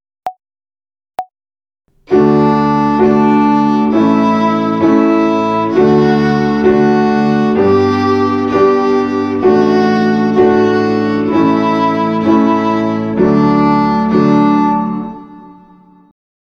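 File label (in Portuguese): Ginástica até o dedo 3 - Corda RÉ - Binária, Violino; Violino para os Pequeninos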